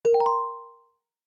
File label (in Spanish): tchat Notification